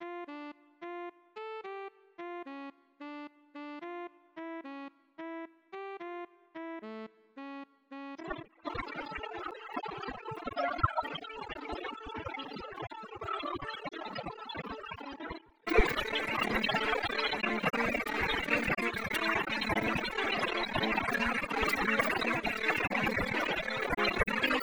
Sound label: Sax, Score